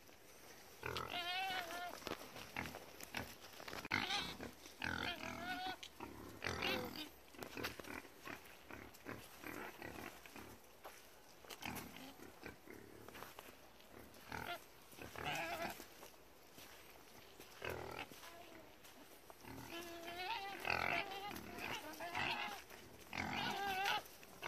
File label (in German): Wildschweine